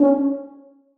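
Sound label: tuba staccato c#3; Sonatina Symphonic Orchestra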